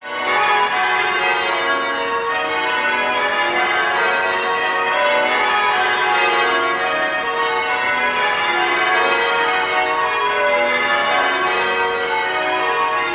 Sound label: bells cathedral chime close by stop light traffic noise in bg sydney aus; st marys; australia; zapsplat